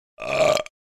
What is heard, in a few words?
Wet burp short